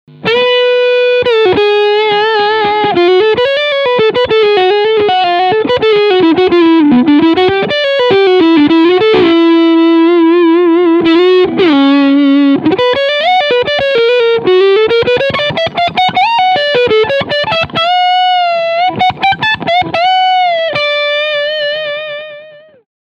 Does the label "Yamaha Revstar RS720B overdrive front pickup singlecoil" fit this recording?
Yes